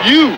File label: LoFi Vocals Oneshots; Vengeance Samples